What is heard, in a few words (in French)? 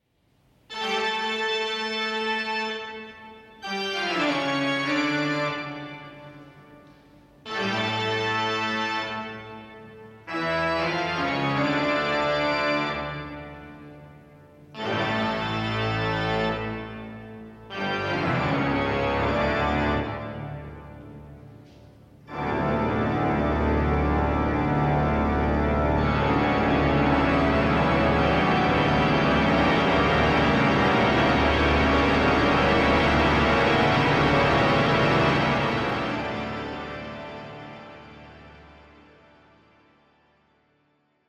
bach toccata en ré mineur